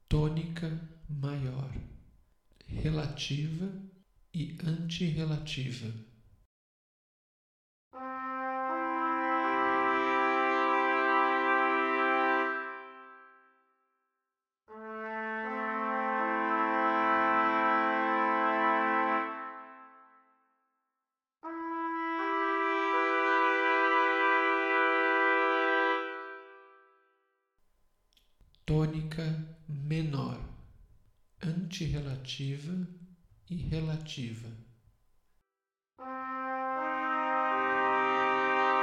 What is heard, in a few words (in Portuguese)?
tonica relativa anti relativa